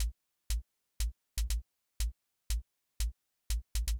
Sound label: Hat Closed; hydrogen